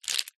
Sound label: crayons many handling movement; office